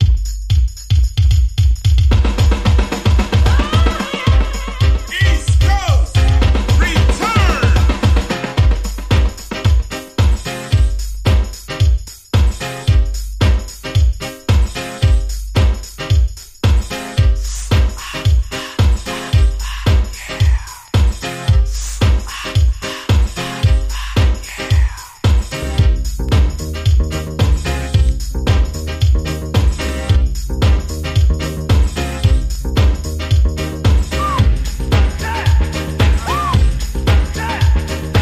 DANCE HALL JAM FIERCE HOUSE VOCAL DUB

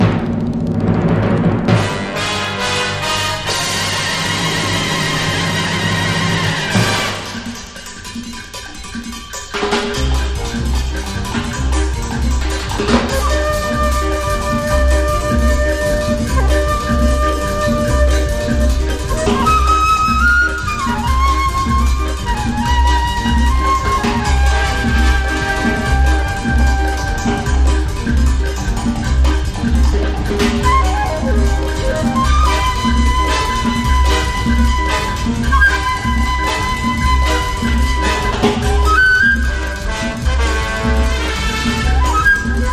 JUNGLE FLUTE; 60'S JAPANESE

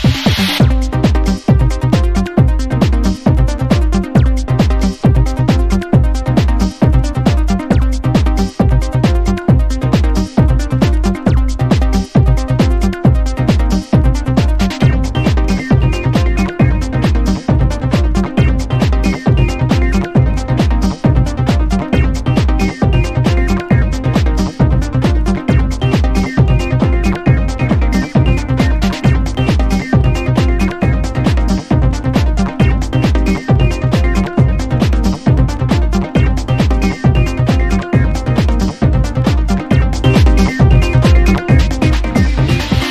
piano klang; JAPANESE TECHNO

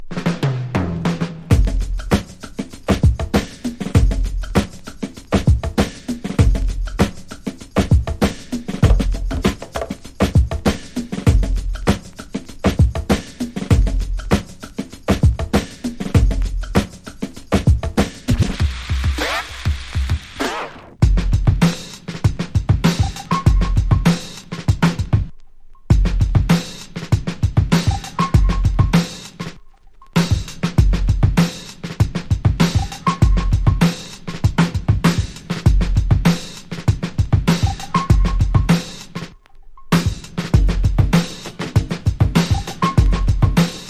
FROM SCRATCH BEATS; 00s-soul

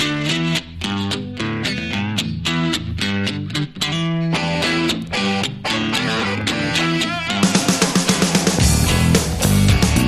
INSTRUMENTAL

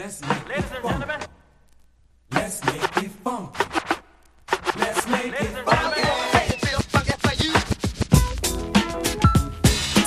INSTRUMENTAL, hip hop rb

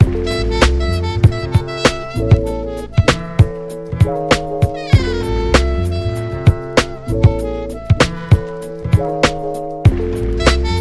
HORNS; BREAKBEATS

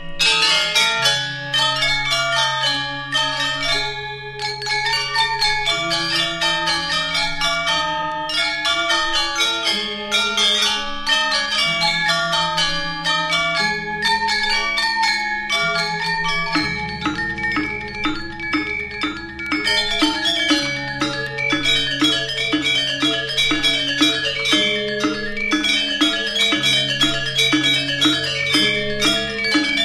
GAMELAN GONG KEBYAR; POST PUNK

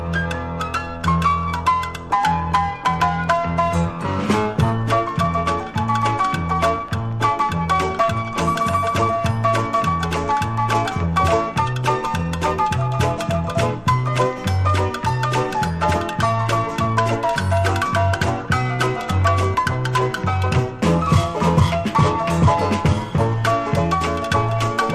TOY PIANO MELODY; 60'S ROCK

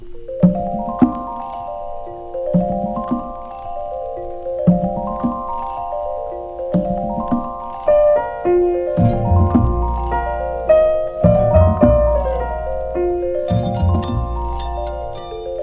CARNIVAL IN PERCUSSION

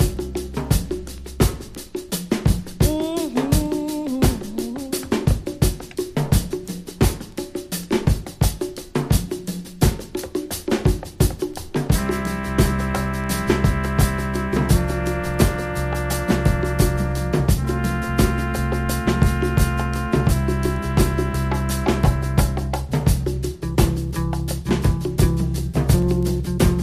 FINGERS AND TOES DRUM BREAK; 1972

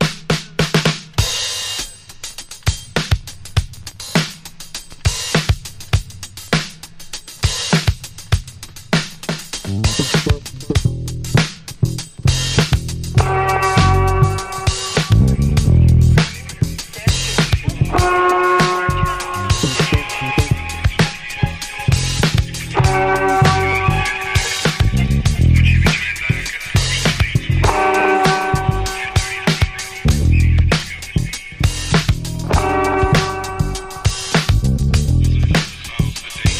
instrumental beats

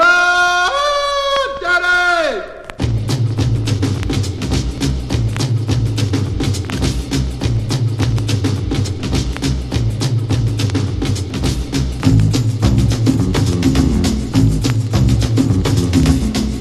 PIANO GROOVE SHORT GROOVE; club-music; big-beat

